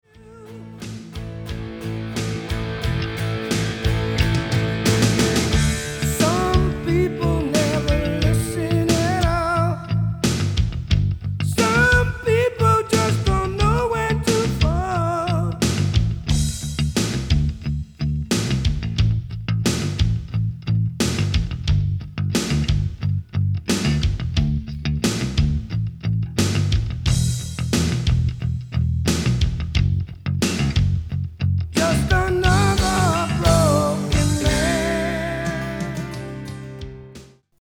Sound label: Funky Bass